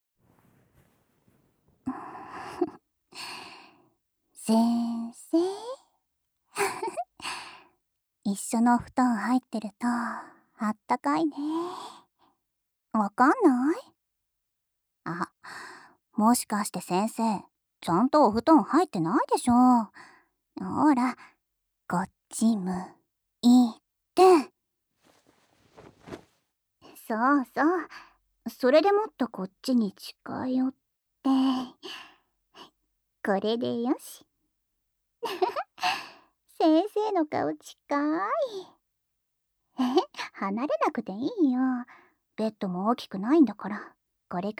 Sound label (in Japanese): 添い寝 から の 耳舐め